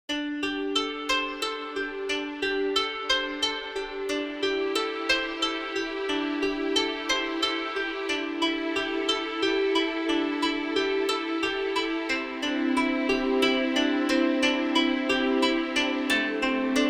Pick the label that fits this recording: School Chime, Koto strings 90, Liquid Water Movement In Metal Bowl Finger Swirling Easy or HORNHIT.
Koto strings 90